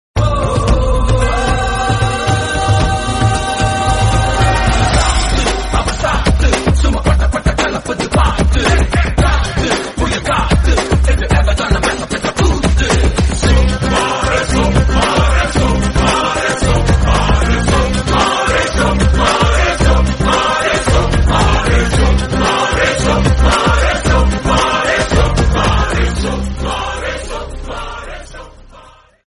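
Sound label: background music, Telugu Ringtone; Pokiri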